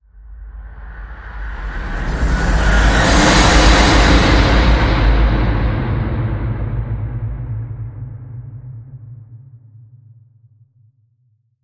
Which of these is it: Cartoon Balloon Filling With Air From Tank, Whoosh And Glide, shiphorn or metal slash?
Whoosh And Glide